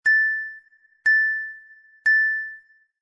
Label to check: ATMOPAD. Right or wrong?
Wrong